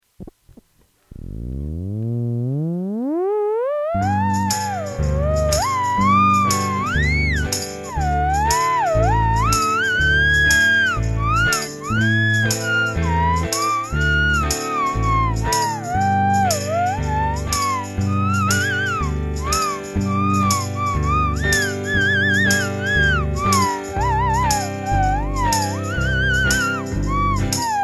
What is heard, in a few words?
Theremin, Norwegian country